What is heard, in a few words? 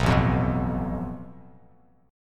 A Suspended 2nd Sharp 5th, Bass Standard; strummed; JGuitar